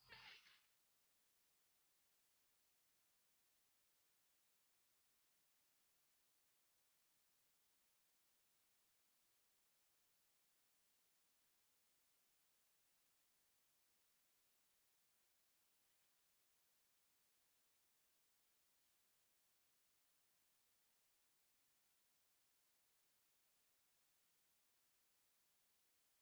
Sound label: Desi Punjabi Ringtone